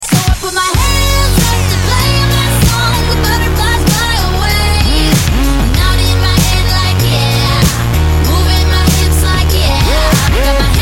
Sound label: win